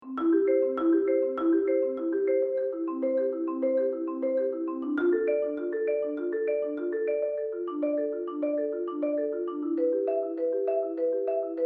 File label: Front Ensemble Permutations Warm-up, Percussion; Racine Scouts 2017